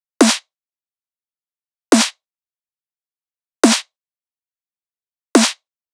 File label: Riddim Drum Loop Snare (140); Ghosthack; Riddim Essentials 2020